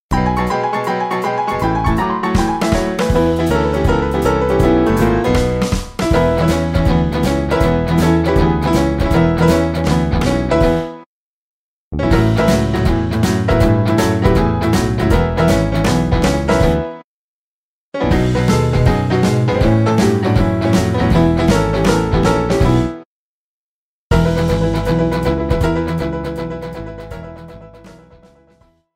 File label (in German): kurze pausen im 4 4 instrumental